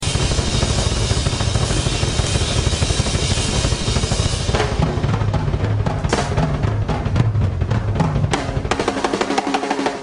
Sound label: drum solo